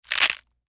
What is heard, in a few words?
Human Bite Food; Bite Food Eat Crunch Rip Tear; Eating; Blastwave